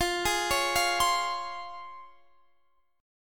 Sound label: C sharp Major 7th